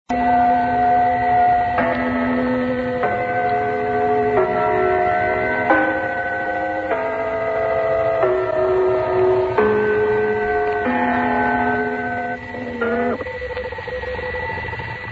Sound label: gong station